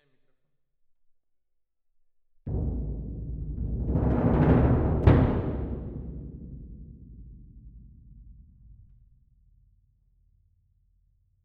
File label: Perc